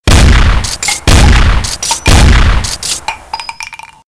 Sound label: shotgun m3; games; ringtones